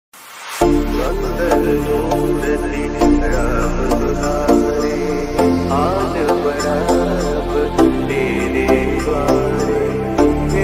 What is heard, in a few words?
mangal murti raam dulaare full song dj soft bass remix